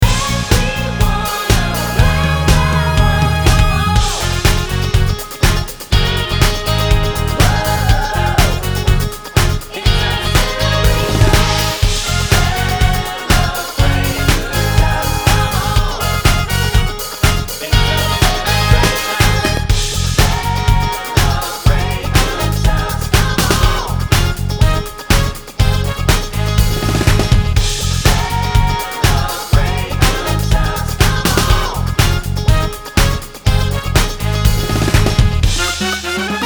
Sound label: Ab o Piano m Backings